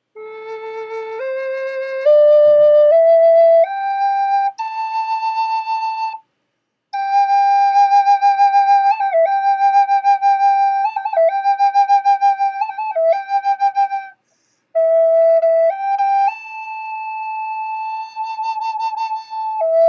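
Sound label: natural, flute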